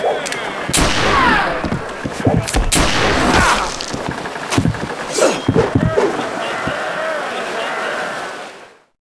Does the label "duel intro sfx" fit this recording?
Yes